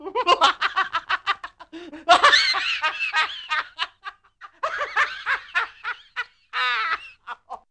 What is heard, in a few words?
Tickle